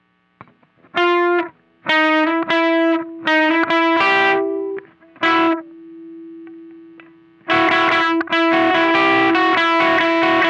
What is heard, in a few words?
Riff Slow